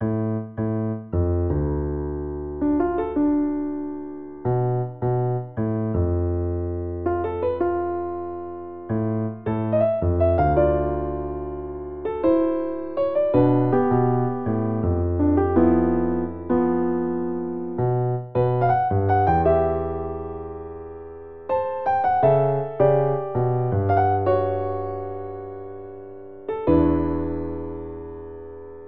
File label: Piano Solo; The Legend of Zelda Majora's Mask